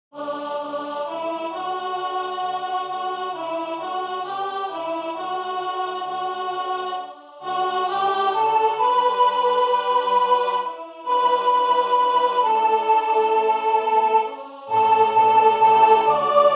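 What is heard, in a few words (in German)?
Sop, Raetoromanische Volkslieder; Sera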